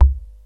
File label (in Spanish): bajo